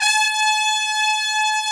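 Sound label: brass, Tpts Sec. f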